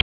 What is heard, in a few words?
type; mahjong; Mahjong Medley